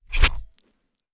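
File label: Knife Bayonnet; Sound Ideas; Guns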